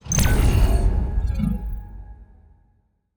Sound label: ui win banner